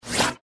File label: SFX Interface Change